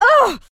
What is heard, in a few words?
pain; celeste